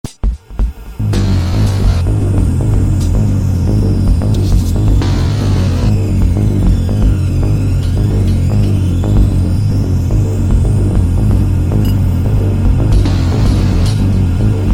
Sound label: hardmode ambience; dungeon; zombiesurvival